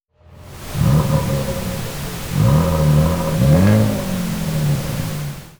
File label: Rev pink